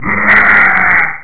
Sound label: war cry